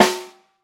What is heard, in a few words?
wet snare f sharp key